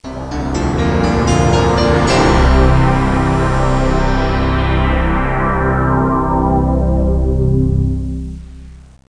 PIANO; Classical

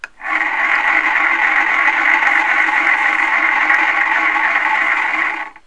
Submarine Analog Computer; Combat; Other Military Stuff; 1,000 Sounds (16-bit)

